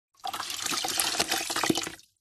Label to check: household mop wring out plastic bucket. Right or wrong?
Right